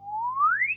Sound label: catcall mouth